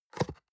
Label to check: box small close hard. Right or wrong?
Right